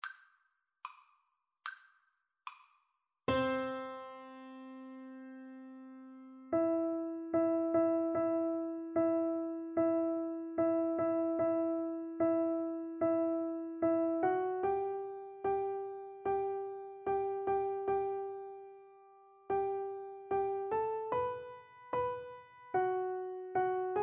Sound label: piano duet